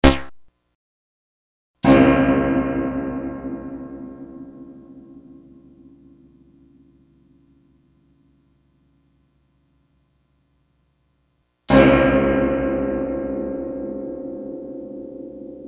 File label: reverb tank